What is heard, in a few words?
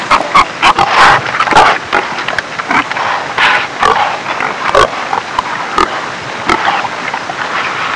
Farm hogs snorting and ambience sounds